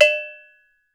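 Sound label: LARGE BLACK B, COWBELLS; Voice Crystal - Steve Reid Definitive Percussion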